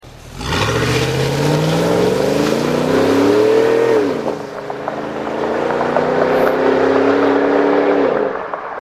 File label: escalade touring exterior launch, exhaust systems; corsa